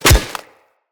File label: Melee Staff Impact Flesh